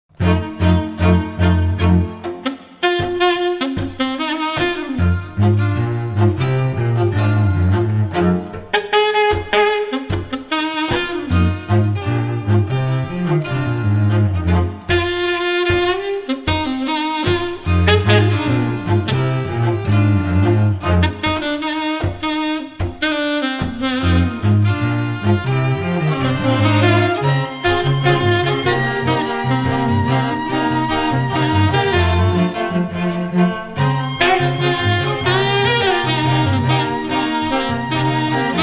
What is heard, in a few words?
Happy; musica para casamento; Orquestra & Coral